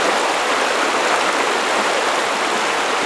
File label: river stereo